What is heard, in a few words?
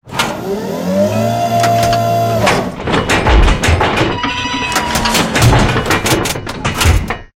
reload cannon T64, tanks; War Thunder